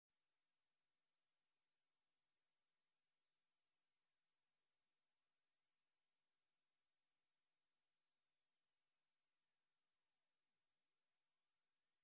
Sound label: Downward sweep 20-8k